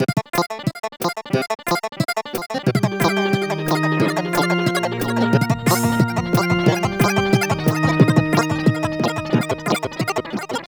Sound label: Carillon